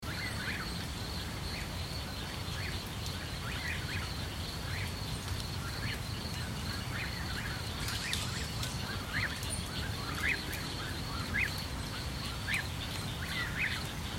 Forest night ambience crickets cicadas and insects